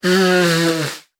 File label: Blow Nose Male; sound-effects-bible